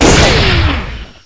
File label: gauss fire, weapons; XDM